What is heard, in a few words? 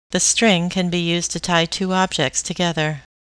string